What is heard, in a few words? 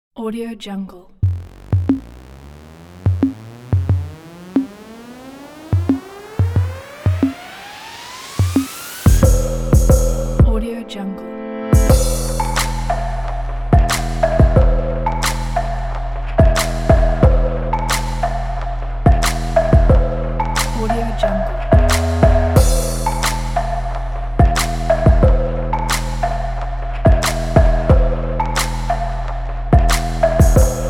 trap and future bass; trap and future bass pack